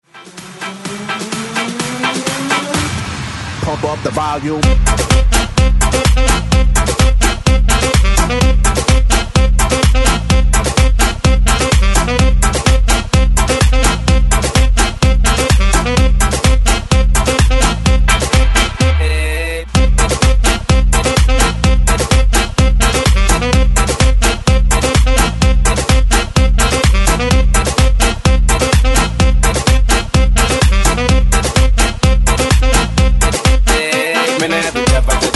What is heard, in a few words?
Latin ReDrum 127 Bpm